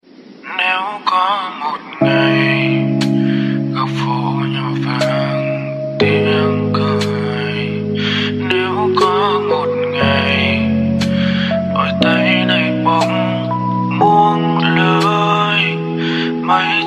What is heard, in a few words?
Lofi